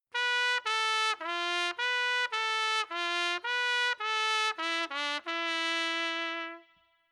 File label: Trumpets